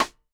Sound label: snare samples, Rock